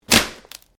Branches Dead Snap

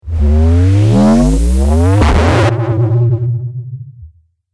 Trailer Riser Dubstep; Rise And Dive Sound Effects

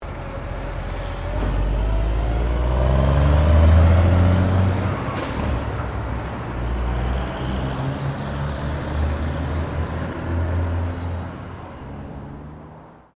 Traffic Semi Trailer Drive Off

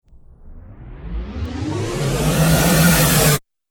Cinematic Riser Abrupt Stop

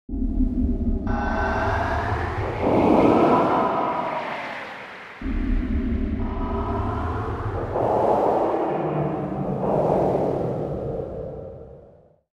Alien Teleport Hangar; Alien Sound Effects; science fiction sound effect

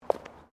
FOOTSTEPS WOMENS LOW HEELS CONCRETE STEP; Footstep Foley; Footsteps (Sound Effects:Human)

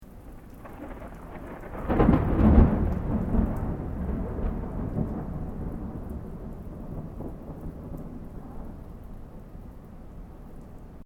THUNDER CLOSE RAIN STEREO; Elemental weather effects

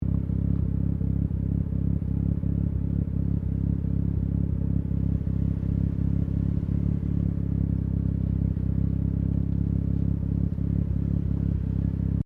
CAR MAZDA RX7 SERIES3 EXTERIOR EXHAUST IDLE LOOP; Car Mazda Rx7 1983 Series3 Exterior Exhaust Idle Loop; Cars (Sound Effects:Vehicles)

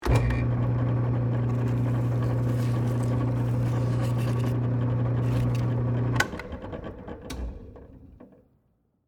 Drill Press, Into, Foam, Core, On, Run, Off, Soft; Drill Sound Effects; Blast Wave

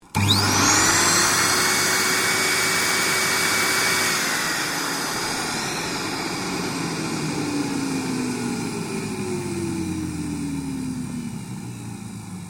Vacuum Cleaner On Off Close; Dyson; Household Appliances; Appliances (Sound Effects:Household); SoundEffects-Foundation4

